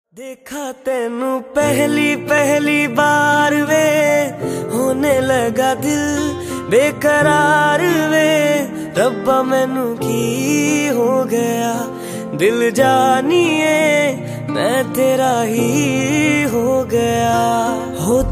romantic song ringtone

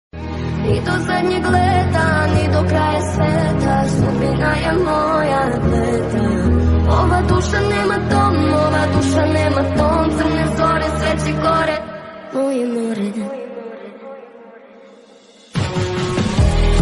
ringtone slowed

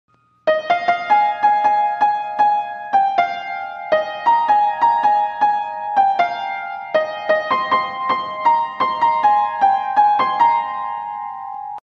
instrumental ringtone piano

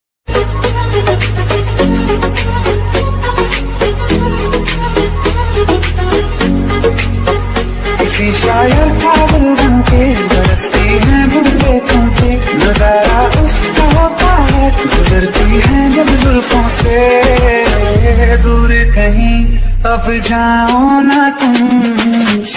instrumental ringtone bgm ringtone

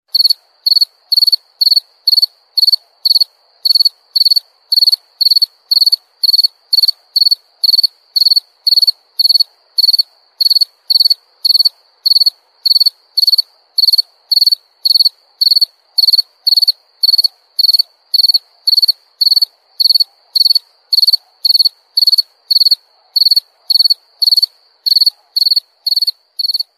cricket insect ringtone sfx

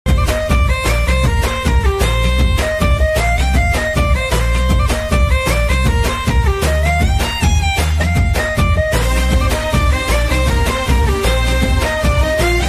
love bgm; thandel; naga chaitanya; sai pallavi

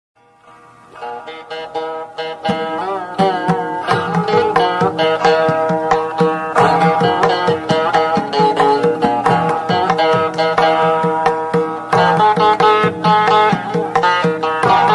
harivarasanam instrumental

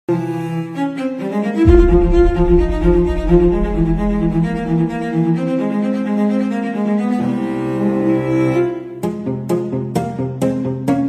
violin ringtone; wednesday

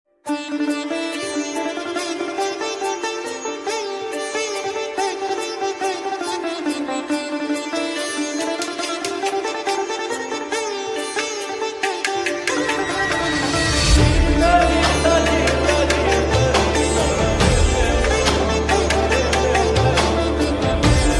bhakti ringtone; ramseeta

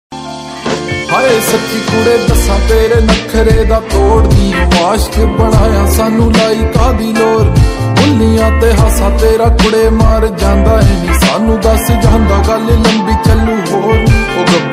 slowed reverb ringtone

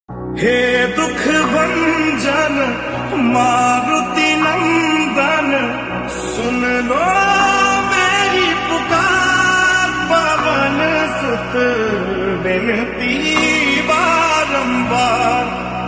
slowed reverb ringtone hindi bhajan ringtone

